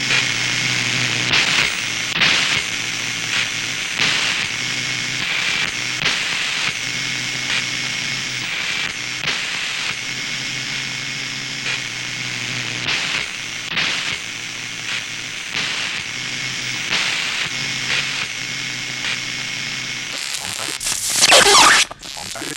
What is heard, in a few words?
Radio Static No signal